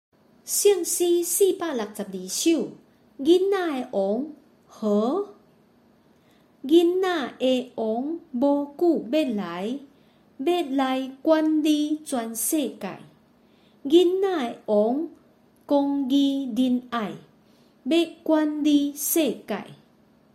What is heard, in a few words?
Chorus, children; king of the little one